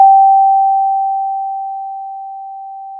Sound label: pop, Sound of a school bell